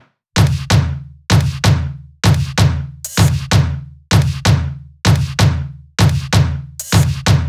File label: Loops 128BPM, Drumloops